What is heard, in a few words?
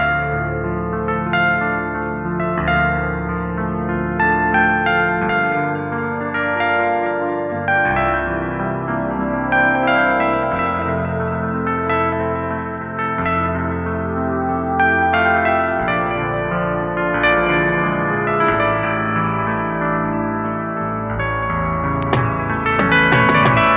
piano, Sad